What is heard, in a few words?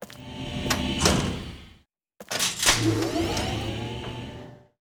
Elev Up Bottom Top